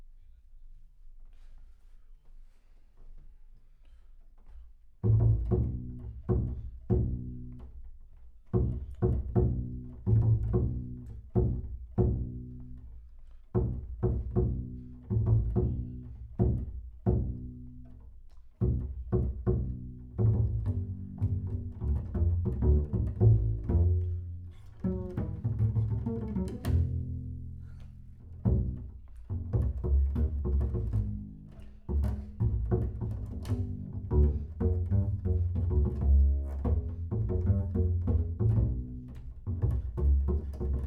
Bass Room

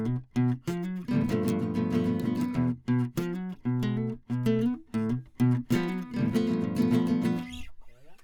Classic Guitar

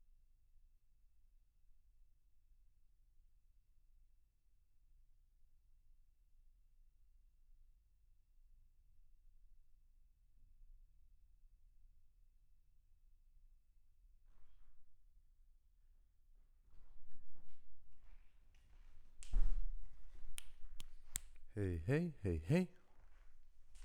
VOC GUIDE

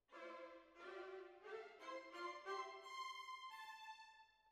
Cellos

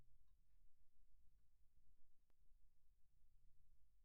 Kick In, 160 BPM

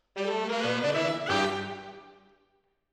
MKH AB, piano

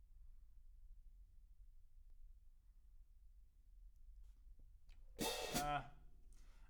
Kit

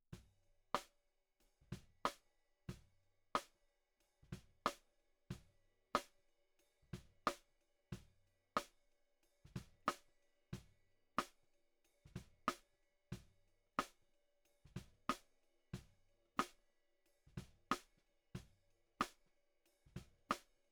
SNR B

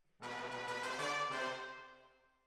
Hall, Jazz for piano

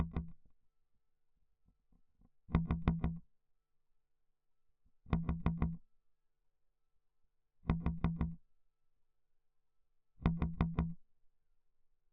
Bass Mute

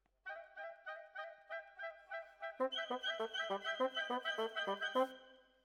Bassoon, Jazz for piano

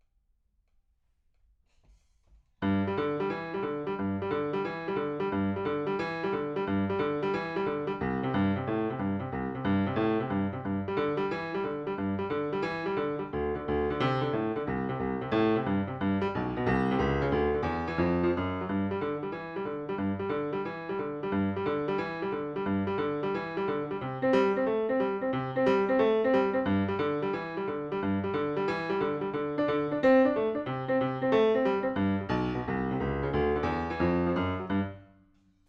Piano, RNR for piano

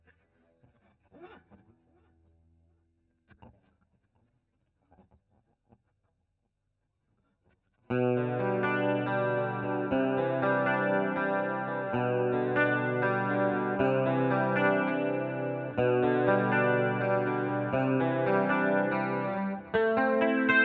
GTR Solo

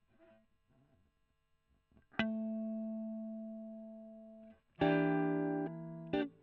E.GTR; Gefell

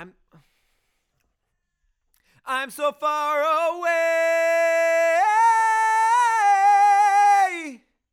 Chorus

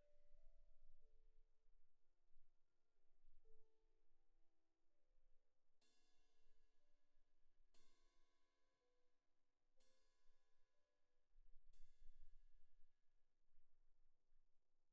Cymb2 Main

